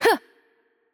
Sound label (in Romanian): Attack Light; bloom minigame